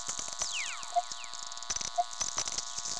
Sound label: whistler, Kagoshima; vlf